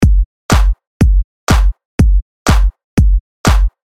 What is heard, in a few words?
Crossover House